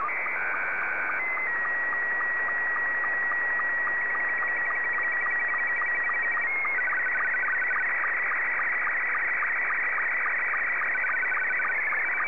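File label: Scottie 2, slow-scan television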